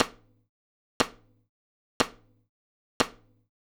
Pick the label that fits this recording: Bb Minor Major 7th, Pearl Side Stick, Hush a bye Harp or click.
Pearl Side Stick